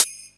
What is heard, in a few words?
HH, Dance Mega Drums; Best Service; HH-HIP